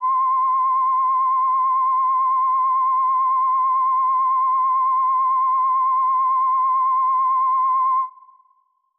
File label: Lead; RB Flava; rnb flava; MPC expansion